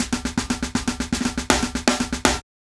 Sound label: Drum; Benny Greb